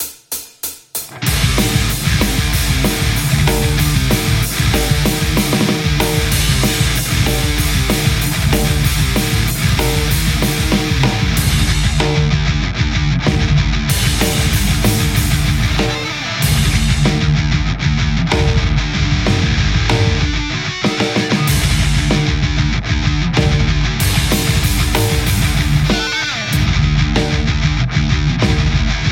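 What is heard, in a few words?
metal Stratocaster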